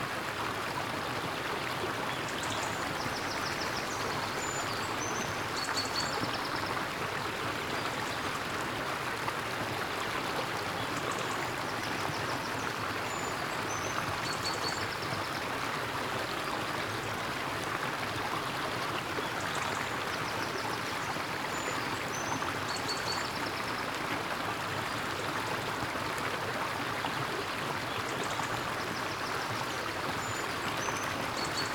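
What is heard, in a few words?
Scottish road stream evening; the 39 steps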